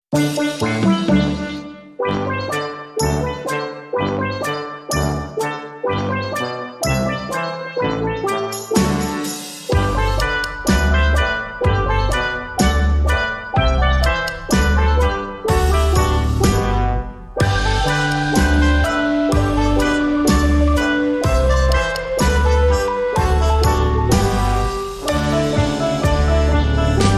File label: Slow Frog Walk